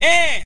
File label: "ah", Voice